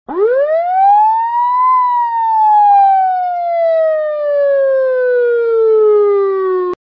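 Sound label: ambulance siren; garrysmod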